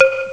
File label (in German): Blech; ST-XX Amiga Soundtracker Sample Packs